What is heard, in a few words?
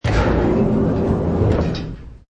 Hi Tech Panel Servo Button